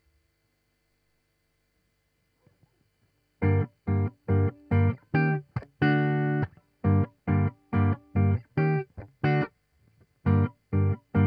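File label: Guitar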